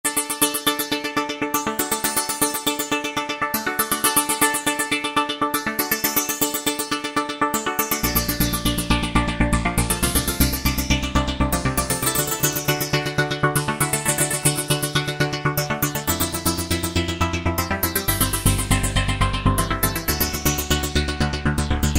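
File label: Sequence Sync Echo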